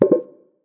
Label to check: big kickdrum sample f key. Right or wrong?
Wrong